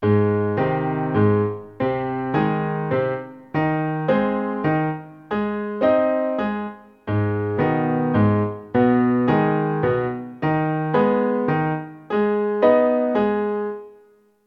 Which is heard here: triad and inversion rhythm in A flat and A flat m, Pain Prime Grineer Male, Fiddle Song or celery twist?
triad and inversion rhythm in A flat and A flat m